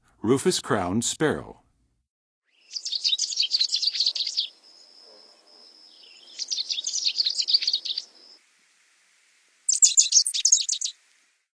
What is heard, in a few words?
Rufous-crowned Sparrow; North America; Western USA; Western USA Songbirds